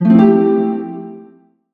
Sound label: harp pluck